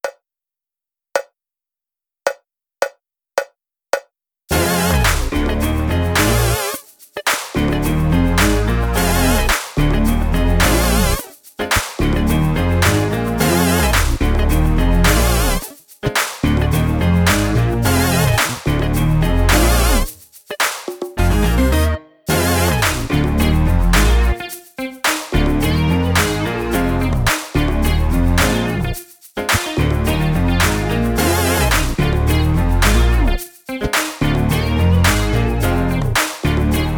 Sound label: Jive drumless